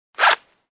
Clothe Zipper

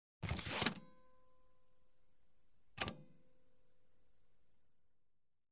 Floppy disc in PC and lock in place

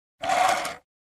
Opening office drawer

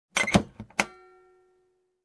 Compartment lock click sound

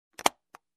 Case Click Lock

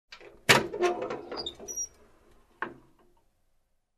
Opening small metal compartment

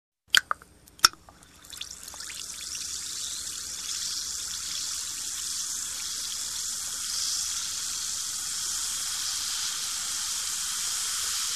Pouder dissolves in water

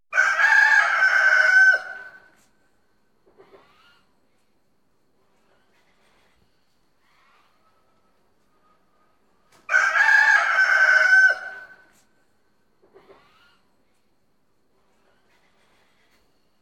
Roosters Crows

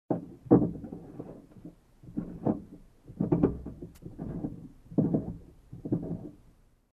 car noises from outside

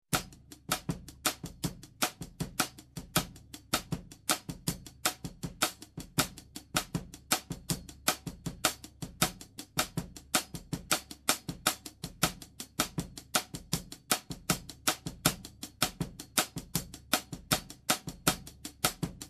Bossa nova drum beat

